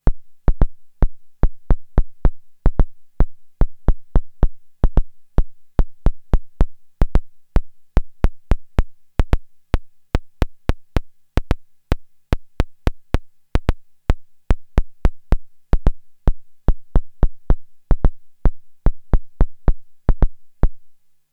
AVP Synth ADS 7 Generator Click

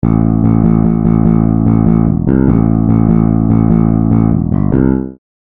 Basses Fuzzy; Scoring; Ken Smith

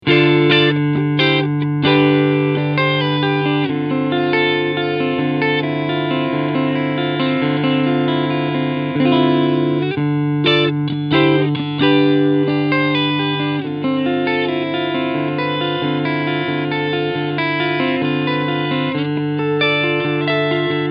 Cleansound

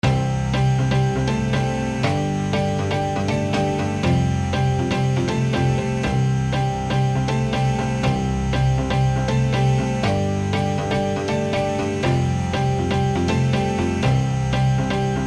EZkeys Cinematic Grand Synth Pop Synthetic

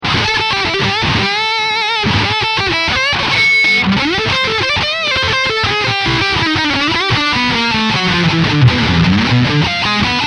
Lead; FabTone

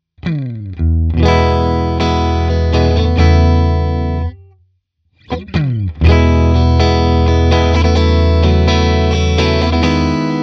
Chords Strumming; Universal Audio MAX LA-2A

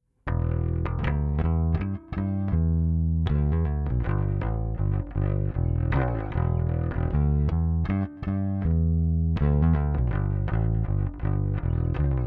Amp DI

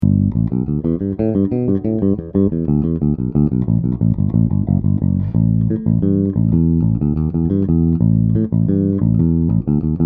Peavey Headliner 1000

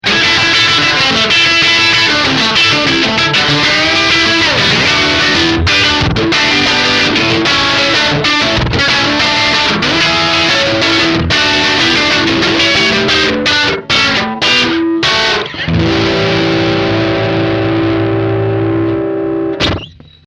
Aggressive Slapback

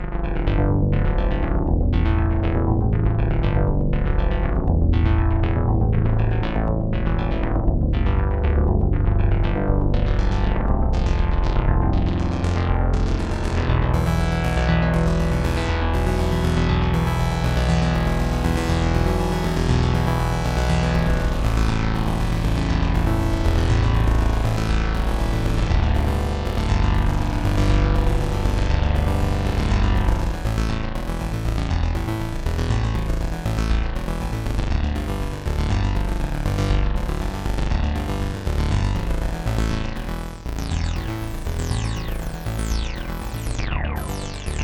Piano Arps; Xils; PolyKB3

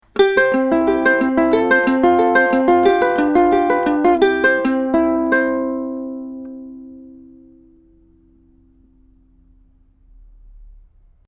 TLM193 Ukulele

